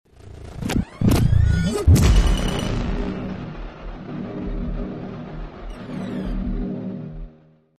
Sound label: cheer; predator; Follower Sound Cheer predator